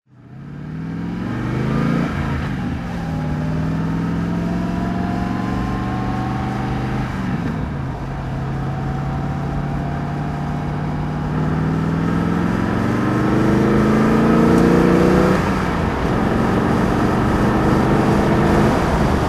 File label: morgan plus8 39 driving